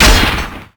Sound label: damage; sonic